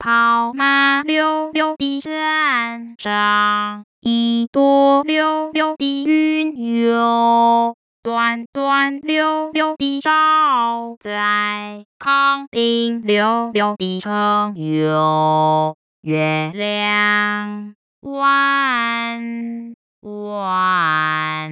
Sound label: madrigal; KangDing